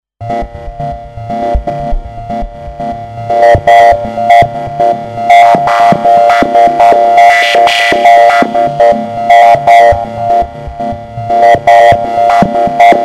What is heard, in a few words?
Drone